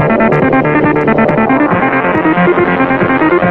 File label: korean tron, Loops Electronic